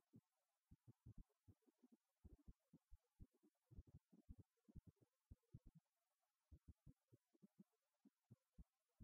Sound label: polarity low rumble KO